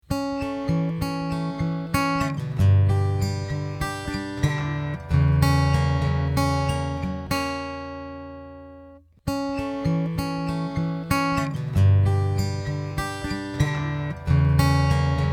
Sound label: Accoustic